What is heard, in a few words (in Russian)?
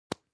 zvuki gubnoi pomady